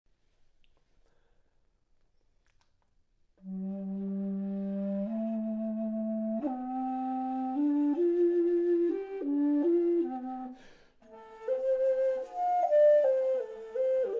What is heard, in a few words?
Bass Dizi